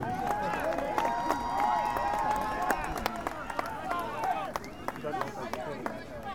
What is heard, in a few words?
Crowd Cheer